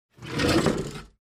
Pull out keyboard holder

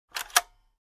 Vintage Camera Film Winder

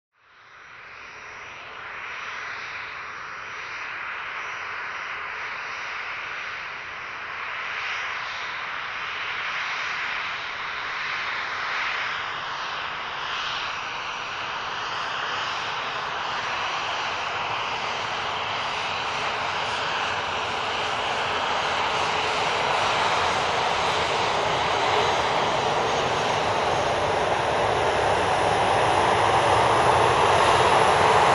Airliner Ground Rolling Whirring Sound

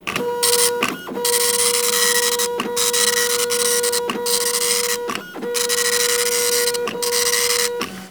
Dot Matrix Printer

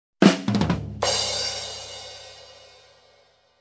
Drums after joke variation

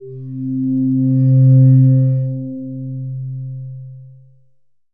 Bowed resonating swell

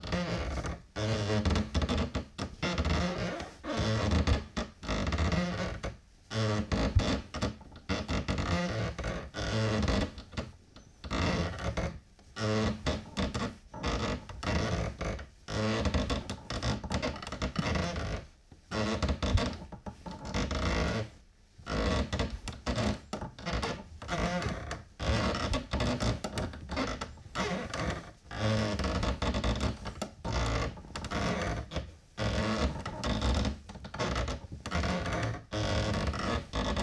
Wood Creaks

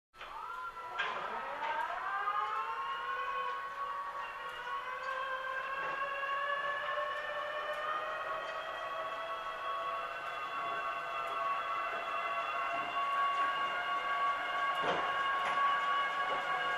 Hydraulic Machine turned on and off

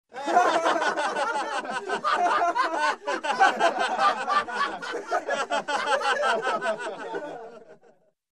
Group of People hilariously laughing

